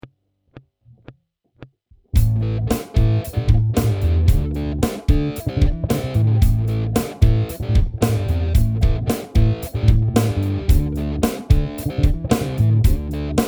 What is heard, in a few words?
solo backing